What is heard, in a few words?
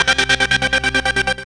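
SEQVOX, sequenced voice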